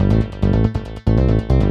SNTH BASS ELCTR 140 A, Techno

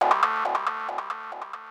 KEY SHOT TEKNO 140 A; Techno2; Singleshots

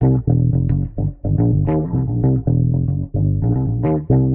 Warped Bass, 110 C; Loops; School Yard; Touch Loops; CosmicFunk

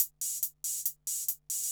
HIHAT TECHNO 140, Techno Drum; Techno2

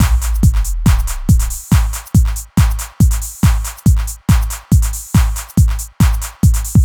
hard beats 140, hard trance beats

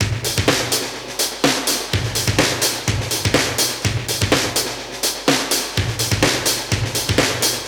125 Classic Breaks, 125

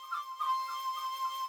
flute, ethno, 160, C

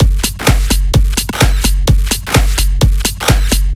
drum loop 128 Full; Anthemic

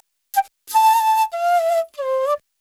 ETHNO EXTRA 90; ethno2

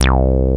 TB BASS

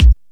BD LOWKICK, bass drum; BASSDRUMS

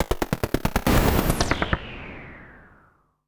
SPACE FX 140; SpaceSounds

